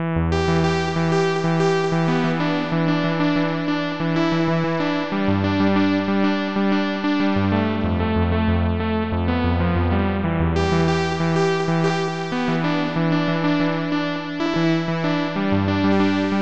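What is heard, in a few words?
keygen song.xm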